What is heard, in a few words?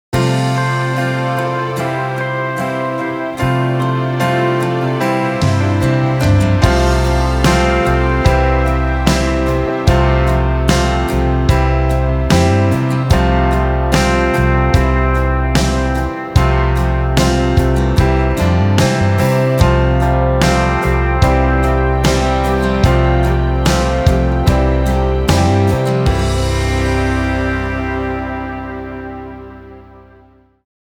instrumental